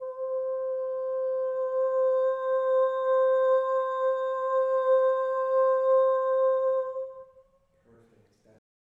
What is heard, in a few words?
Cres